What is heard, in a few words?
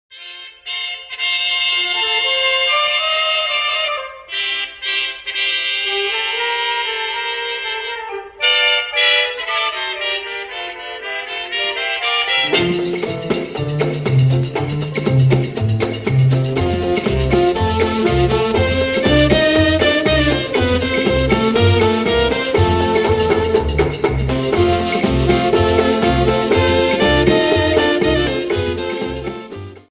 Orchestra